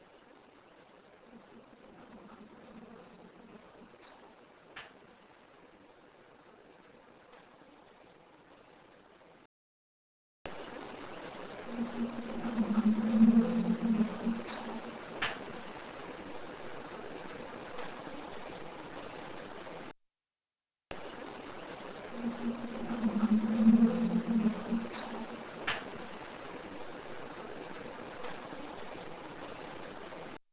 Small Varoom Possible Toilet; This could possibly be a toilet; Original x1 Amplified x2; Small "Varoom" and Possible Toilet noises; Bisbee/Tombstone

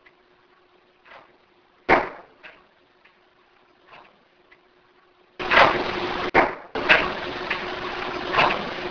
super loud bang; Possibly from inside the room. The clip contains the original, and a boosted version of the less powerful bangs before and after it; The Jerome Ghost Report

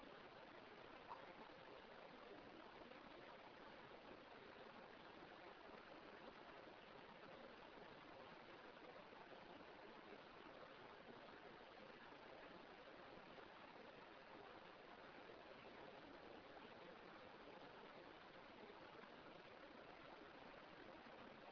Whispers; Tombstone; Sounds like constant whispering, especially during the beginning of the clip. Original x1 Amplified x1 Cleaned x1; Bisbee/Tombstone

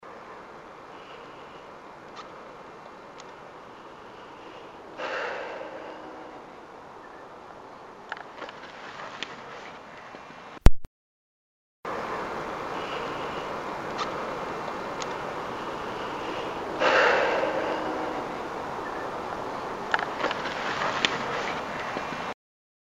loud scrape

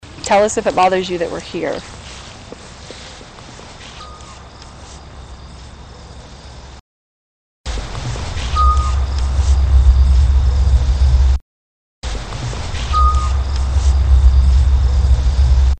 Unexplained Squeak; As it sounds, an unexplained squeak noise. It sounds almost like a rusty water pipe type sound; Original X1, Amp and NR X2; Trails End Historic Site, also known as Kendrick Mansion; Wyoming

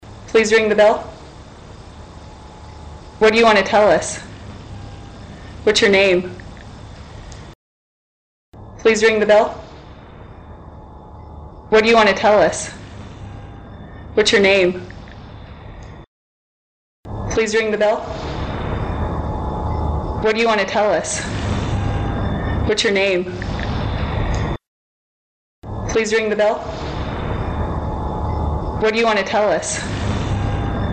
Weird Tones; Trails End Historic Site; Wyoming; This one is hard to hear, but comes after "what do you want to tell us". It sounds like a lower and then a higher note; Kendrick Mansion

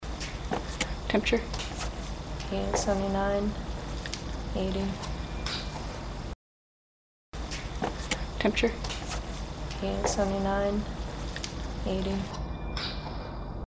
Ding Ding; Two distinct bells; These bells are noticeably lower in tone than the previous; Original X1, NR X1, Short NR X2; Trails End Historic Site, also known as Kendrick Mansion; Wyoming

